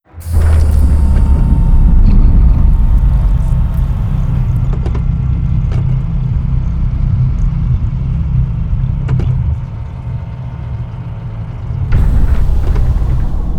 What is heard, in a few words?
molluscoid colossus closing; apocalypse